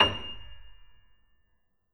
Grand Piano F6 p

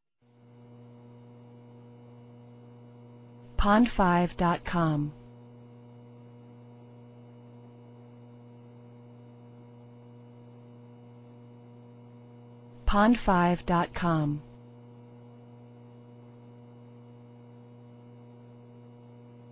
buzzing room noise

